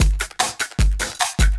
LOOP, Drum & Bass, 150 BPM

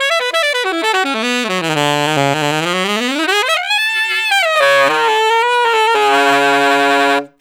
Alto Free, Sax; Sonic Foundry (Sony Creative Software)

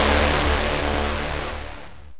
SYN, dance; Sound & Vision - Gigapack II

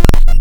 LoFi, wavetables; Architecture 32

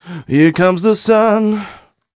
m 127 mel a, Vocals

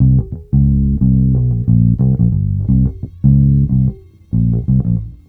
Bass Loop, Ambient Grooves

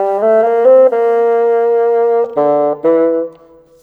Bassoon, Orchestral, Rock Pop; Sonic Foundry (Sony Creative Software); Orchestral Series VOL-4 - Rock and Pop

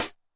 closed hat MOD Tune, 909; classic machines; 909mod

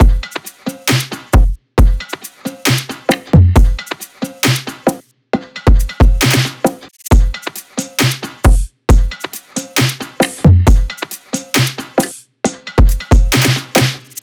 loop full 135; Seismic Loops

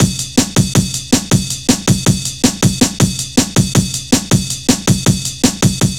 160, Drum Loops, Drum & Bass; Total Drum&Bass

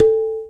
EQ Perc, Percussion Mix

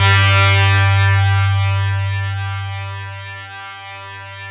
CELLU G1, Yamaha VP-1; Music Store; 90's Sample CDs; CELLULOID

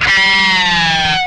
whammy fx, guitar; 90 s Sample CDs; Zero G - Funk Guitar

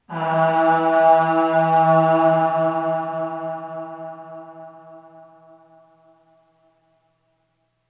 Aah Short E2 Long Reverb; Harmonic Building Blocks

